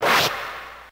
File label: Attack; RedDot